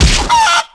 hit chicken; animal; Tony Hawk's Project 8; XBOX

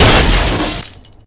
hit car; hits; Tony Hawk's Project 8; XBOX

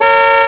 horn; SpyHunter; XBOX

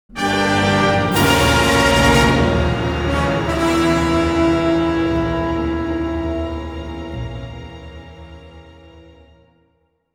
Death, music; Character Indy; XBOX

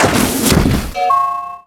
Box out; Cars; XBOX

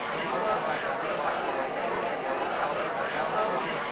party; Soultree

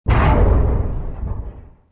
door stone stop; movers

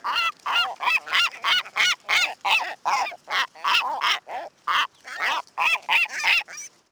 Seagull; ambience; Project Gotham Racing 2

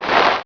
leather jacket running left; characters

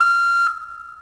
truck backup beep; Tony Hawk's Project 8; XBOX

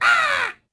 BUZ SQWK

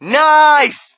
crowd, shout; Mike Tyson Heavyweight Boxing

